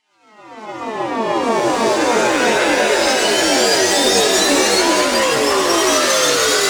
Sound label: Murkiness Riser FX, Dubstep; One-Shots; Effects; Ghosthack; Dubstep Sounds 2025